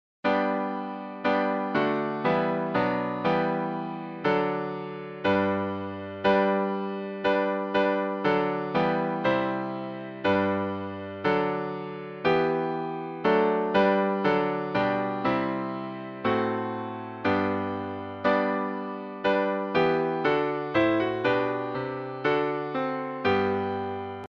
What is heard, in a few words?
Hymns